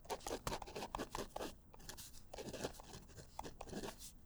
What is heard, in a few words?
Pen Writing; Books, Paper, Writing